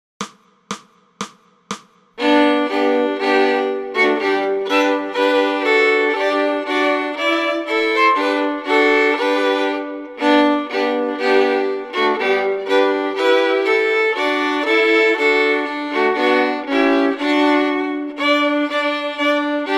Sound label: Fiddle; Hark! The Herald Angels Sing